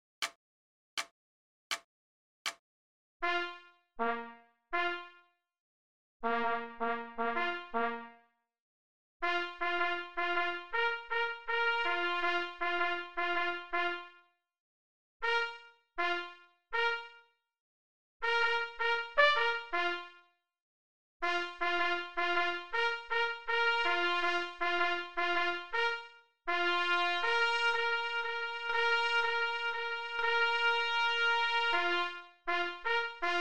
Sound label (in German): Jubilaeumsgruss - Signalhorn